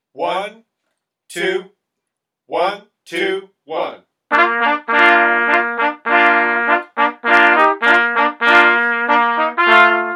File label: Military March All Parts; virtualconcert